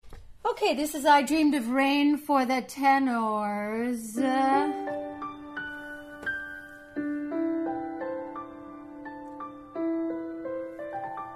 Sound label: Tenor